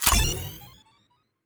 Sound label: Core Pickup